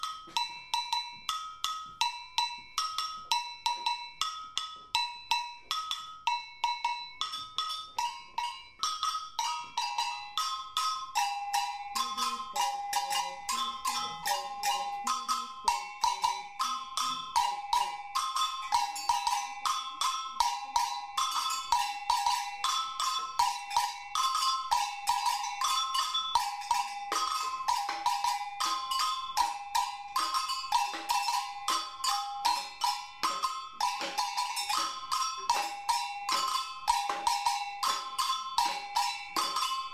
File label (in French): afoxe agogo, batucada